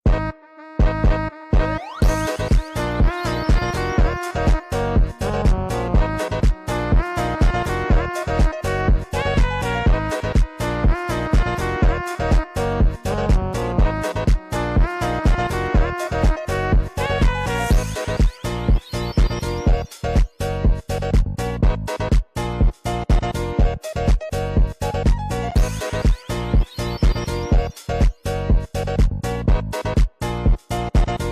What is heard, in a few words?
type beat